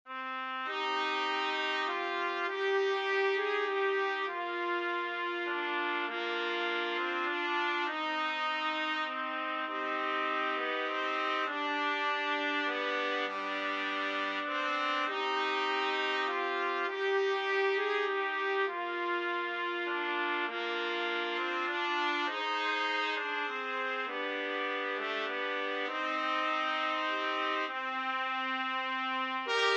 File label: greensleeves 3 trumpet